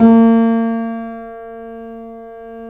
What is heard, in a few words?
C7 PP, The Ultimate Piano Collection; East West